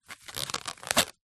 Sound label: foley plastic bag very thin rip split; zapsplat